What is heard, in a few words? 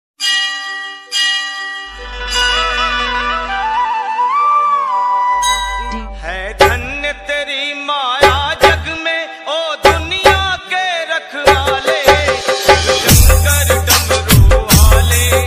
mobile phone ringtone